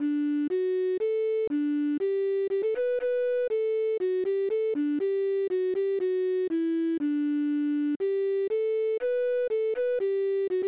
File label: hymn; Crawshaw ms